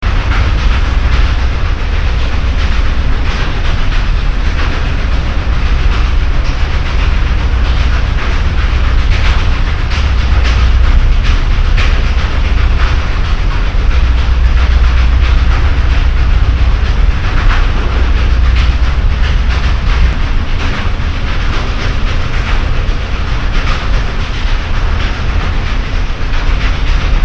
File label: Breakbeat